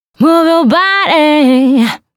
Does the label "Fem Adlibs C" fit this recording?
Yes